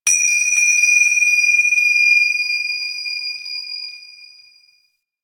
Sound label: Temple High Bells; Ambience; Warframe; Corpus Ship Remaster